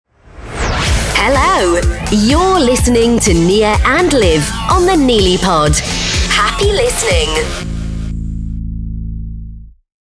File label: jingle; NiliPOD 'Hello' Jingle with music